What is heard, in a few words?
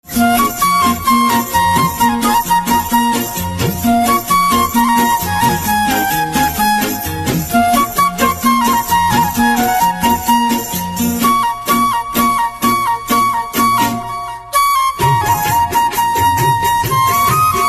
Flute